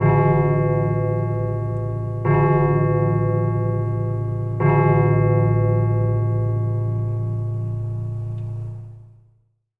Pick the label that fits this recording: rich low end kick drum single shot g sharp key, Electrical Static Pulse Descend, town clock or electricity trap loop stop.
town clock